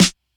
snare chronic

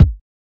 kicks

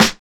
Closed Hats

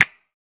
Snap; Percs

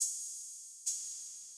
Open Hats

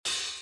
Open Hat INTRO

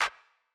Claps

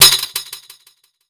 Perc

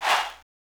chant, Vox

